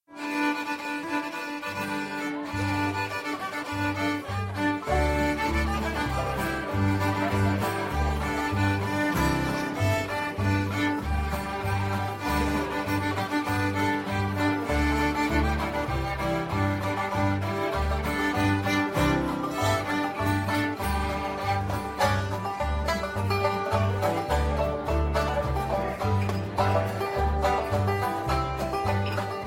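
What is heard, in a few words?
fiddle banjo; Waterbound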